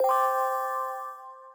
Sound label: MOVE; C64 Dreams; Multi-Sound Default